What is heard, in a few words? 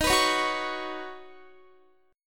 D sharp Minor 7th Sharp 5th, Guitar; strummed